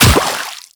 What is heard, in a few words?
Run Water, Footsteps; Characters; Warframe; The New War; Kahl